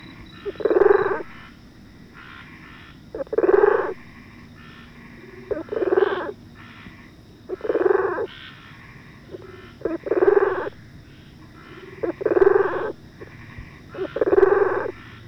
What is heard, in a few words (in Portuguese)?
Bokermannohyla circumdata canto anuncio